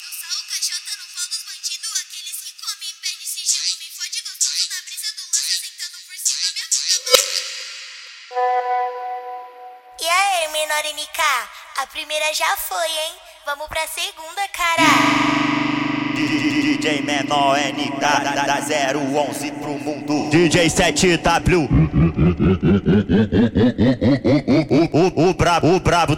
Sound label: phonk